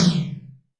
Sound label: Tom Md Phase, Alesis D4